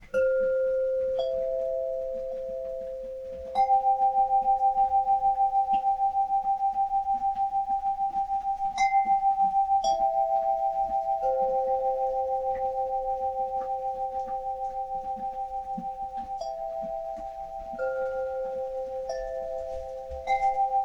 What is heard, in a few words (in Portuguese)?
Triade Maior